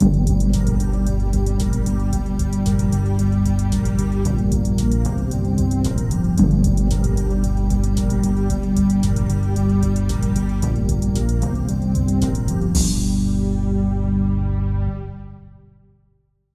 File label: checkpoint; sven